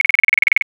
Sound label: score count